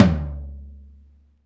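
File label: tom low, Roland; Club-50 - Foundations Roland; Ext.Snare 1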